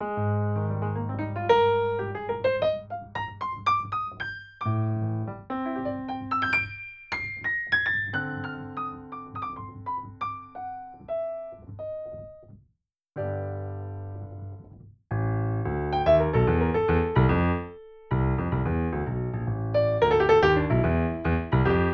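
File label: Jazz Bechstein Grandeur; BlackIce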